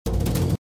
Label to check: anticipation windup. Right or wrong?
Right